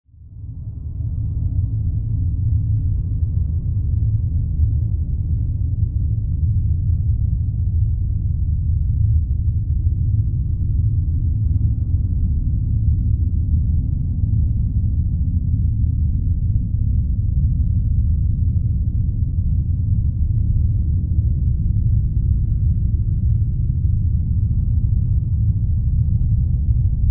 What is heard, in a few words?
Hum, atmosphere of depth, water